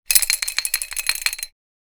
Chain pulling through mechanism

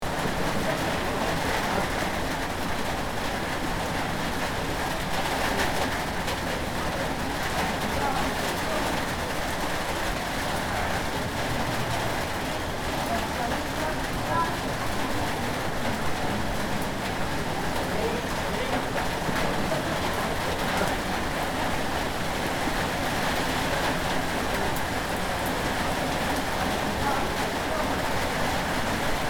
Rainy train station rain patter on metal platform

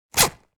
Money pouch zipper; purse

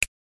Selection button interface classic

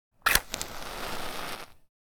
Matches lighting with fire burning striking

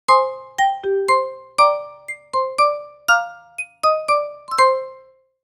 Marimba win success level complete mobile game

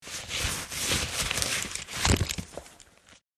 Gas mask taking off; military equipment sound effects

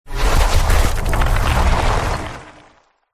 Rock ground opening

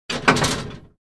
Lat machine weight stack

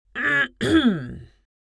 Female old woman clearing throat; cough

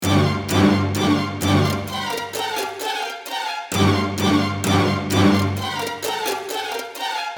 String ensemble loopable horror jingle

